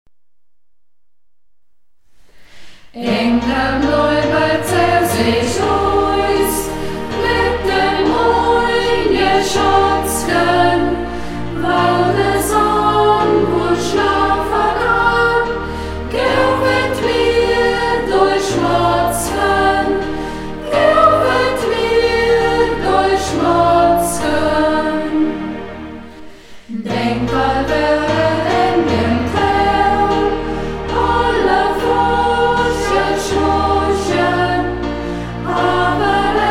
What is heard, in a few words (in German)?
chor; Ortsmundart: Burgberg; Angderm Lirber såß ech iest; Burgberger Chor; Hegt wird gesangen